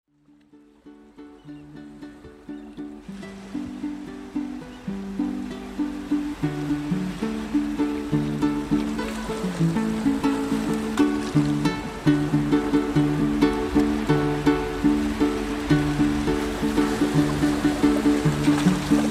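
Shum Moria, Osennee More, Uspokaivaiushchie Zvuki I Shum Osennego M, sea noise, autumn sea, calming sounds; Shum Moria Kollektsiia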